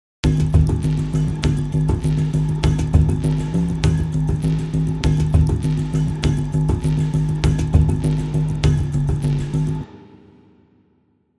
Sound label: Electric fields forever